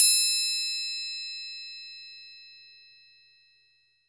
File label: Triangle open; LA Perc; Akai MPC-500